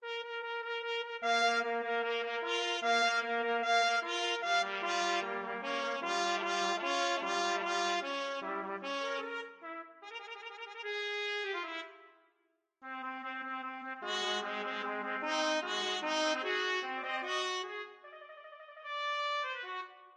poules trumpet duet

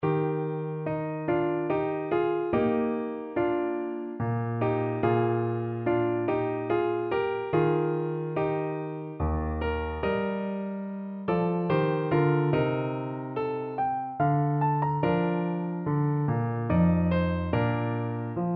andantino in e flat major, piano

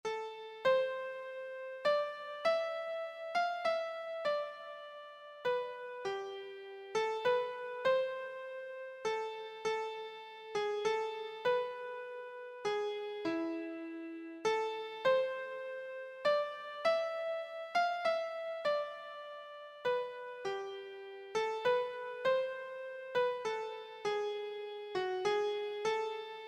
greensleeves, keyboard

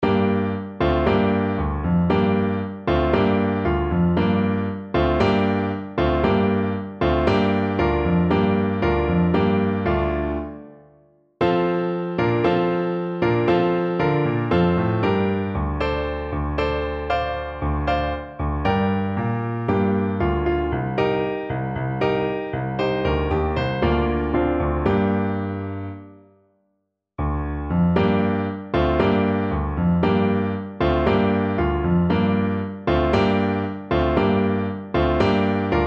clarinet

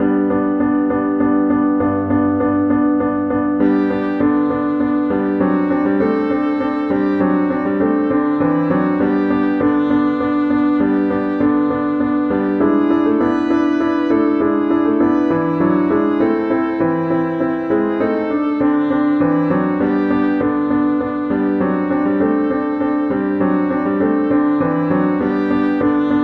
unfinished, viola; schubert

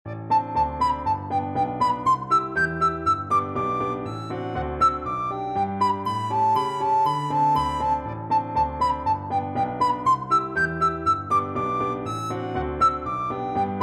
recorder

